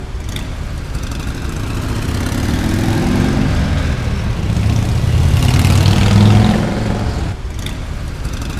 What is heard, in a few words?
CAR BYE, City Ambience; SFX